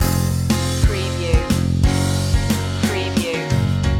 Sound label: sunny day loop